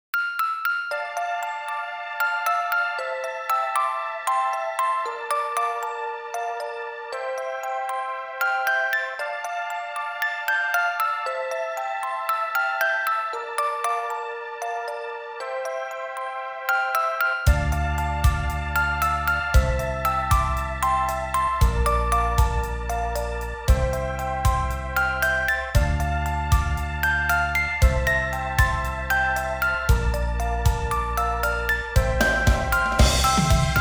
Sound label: (Orgel ver.); epica stella